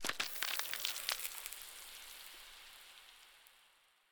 ambient, dried ghast

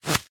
leash, leash knot

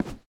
single swap, shelf

